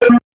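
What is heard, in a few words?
server message; NATICQ2; Naticq pack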